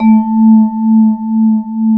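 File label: VIBRA A2, percussion; AKAI S-Series CD-ROM Sound Library VOL-8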